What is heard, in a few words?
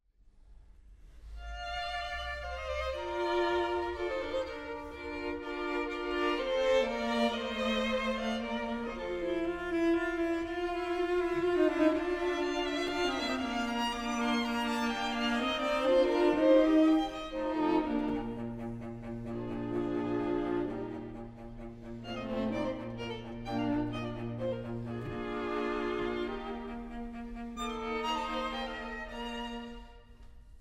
String Quartet; Wolfgang Amadeus Mozart; Mozart String Quartets